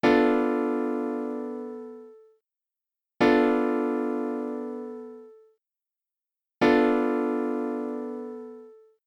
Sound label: Bm7b5 Piano Chord